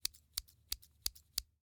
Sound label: Scissors, snap, snip, shear, small, snips; Eiravaein Works; Vaeyan IV